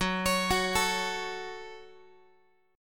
Gb Minor Gb Guitar; arpeggiated; JGuitar

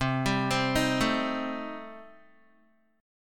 C Minor 6th Guitar, chord; Cm6 arpeggiated; 8,10,10,8,9,x

